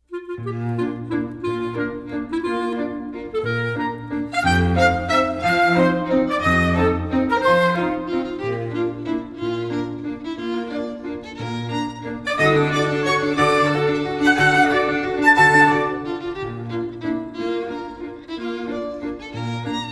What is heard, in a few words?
Lexington Waltz